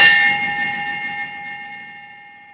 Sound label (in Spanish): golpe; chavo del 8